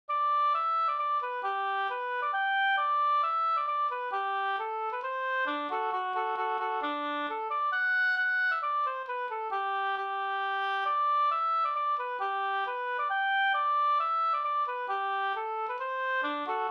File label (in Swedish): mazurka; e Fredrik Larsson Vanso Leffler47